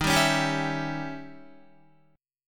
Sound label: Eb 7th Flat 9th, Eb, Guitar; Eb7b9 strummed; JGuitar